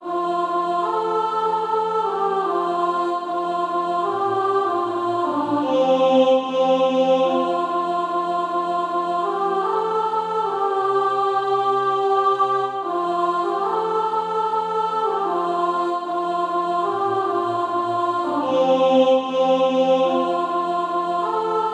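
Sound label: soprano, Practice Music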